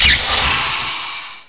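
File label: teleport; CHASM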